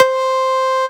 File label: Synths Root C